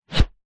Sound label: warfare axe or other heavy weapon swing